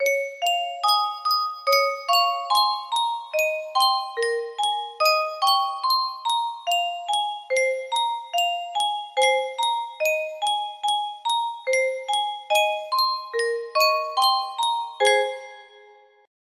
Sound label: yunsheng music box